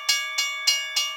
CROSSING TRAIN; SIMUZENSOUND

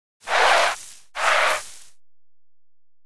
COLLISION WHEEL EARTH, Metal Skid Earth; SIMUZENSOUND

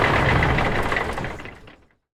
MOTOR OFF; SIMUZENSOUND

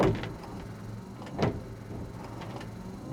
Wiper Washer; SIMUZENSOUND

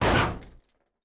COLLISION HIT, Collision Hard Hit; SIMUZENSOUND

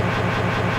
MOTOR IN; SIMUZENSOUND

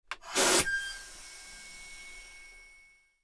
AIRBRAKE; SIMUZENSOUND

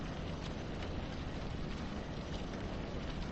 ASPHALT SLOW; SIMUZENSOUND

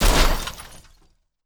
COLLISION HIT

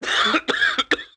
COUGH MEN; SIMUZENSOUND

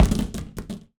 Plastic, Collision Plastic; SIMUZENSOUND; TURISMO

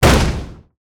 BLOWOUT; SIMUZENSOUND

